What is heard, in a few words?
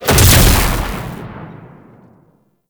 Fulmin Prime Proj Explosion; Weapons